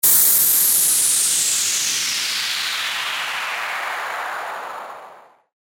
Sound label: WHOOSH